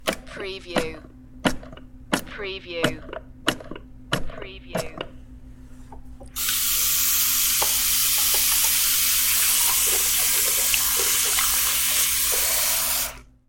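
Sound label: Soap Dispenser Wash Hands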